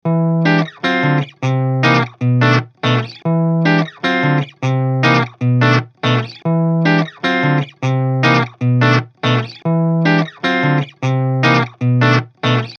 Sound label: BRIDGE, eclipse active, krank